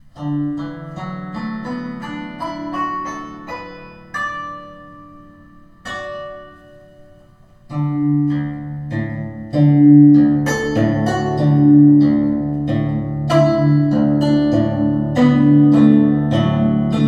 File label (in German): Pentatonik Anhemitonisch